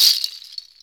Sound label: PALM STONES; Best Service Advanced Media Trax Modern Composer